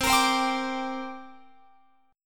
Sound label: C 7th Suspended 2nd, Guitar, Open F; C7sus2 strummed